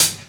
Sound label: good hat sound d key; drum single hits one shots; hat samples; Duration 0:01; Samplerate 44100 Hz; Channels Mono